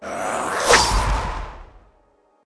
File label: gast attack